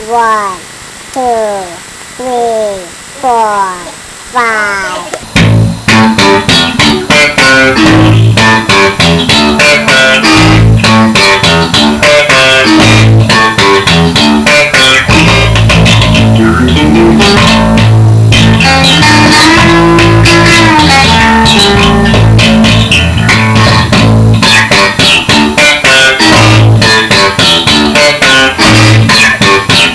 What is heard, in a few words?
Nitrogenous Bass